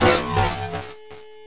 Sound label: sound downgrade spaceship; Powermanga